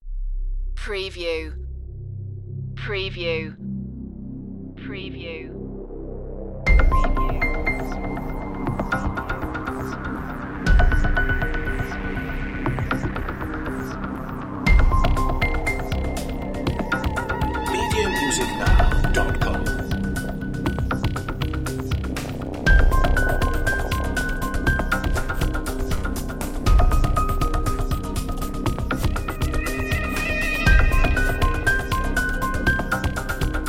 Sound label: underworld series dramatic cue